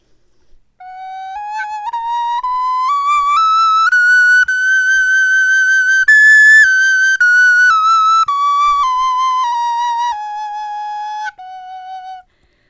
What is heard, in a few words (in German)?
tonleiter, knochenfloeten